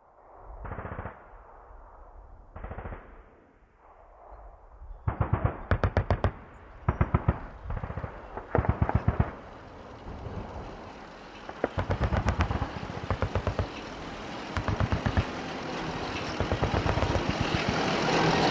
War, shootout, driving past a tank, shooting a tank; explosions